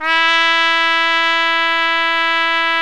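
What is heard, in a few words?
BRA TRUMPE, trumpet, brass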